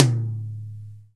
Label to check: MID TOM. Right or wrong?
Right